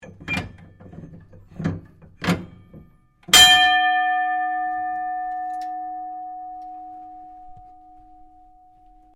ENGINEERING SIGNAL RING, Sounds onboard HMAS Castlemaine; Boats (Sound Effects:Vehicles)